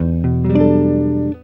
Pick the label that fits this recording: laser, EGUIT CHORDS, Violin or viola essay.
EGUIT CHORDS